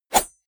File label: blade whoosh small